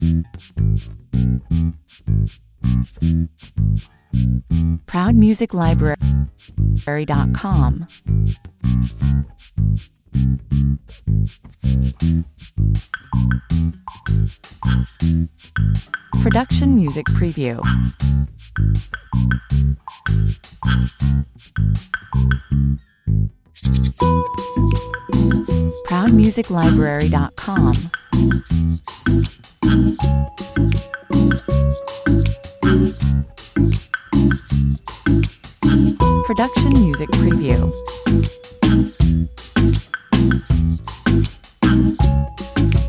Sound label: quirky shuffle